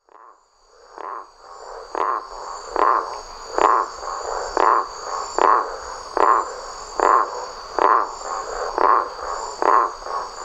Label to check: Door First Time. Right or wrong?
Wrong